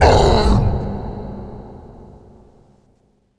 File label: supermutant groan